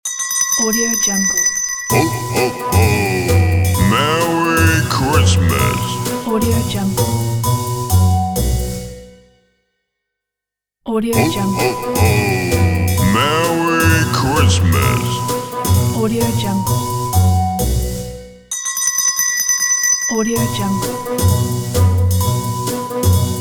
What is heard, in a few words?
merry christmas logo